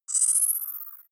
bonus up, signale

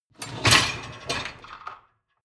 dishwasher lower tray draw out short; maschinen; spuelmaschine

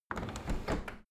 window handle close tilt hard; objekte; fenster

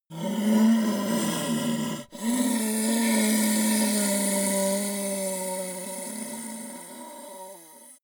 ogre dying groan weak; kreaturen

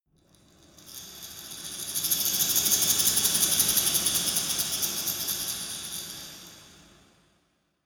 dark resonator rattle dynamic; maschinen

